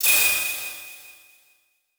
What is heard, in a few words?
Title Transition Feedback Blast; Production Element